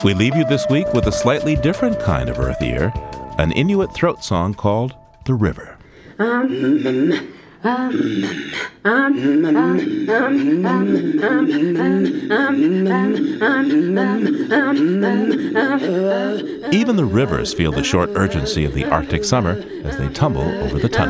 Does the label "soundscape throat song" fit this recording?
Yes